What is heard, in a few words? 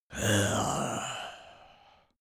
zombie moan idle